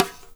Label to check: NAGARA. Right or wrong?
Right